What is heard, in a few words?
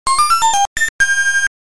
Extend Music; arcade; Dig Dug